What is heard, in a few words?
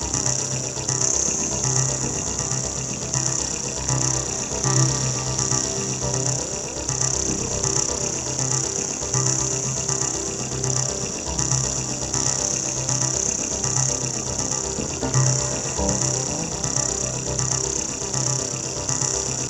Arpeggio, keys; Sonic Foundry; James Johnson Spektral Minimalism; Sublime Keys